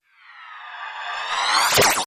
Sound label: Phased Stutter